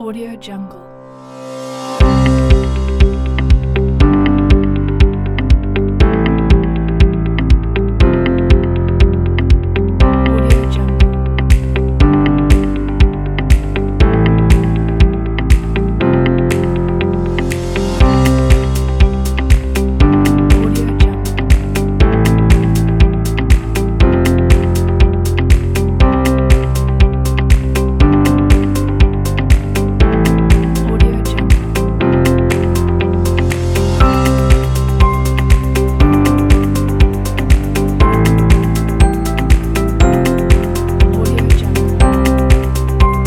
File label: succes upbeat corporate minimal